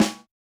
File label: Yamaha DJX 2B, SD Jazz H